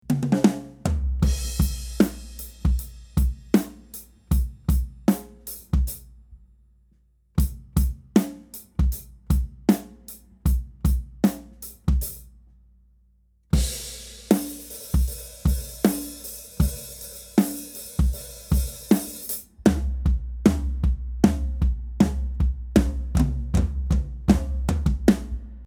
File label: Section C Kick and Snare